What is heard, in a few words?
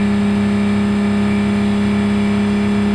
Prowler Loop, Automobiles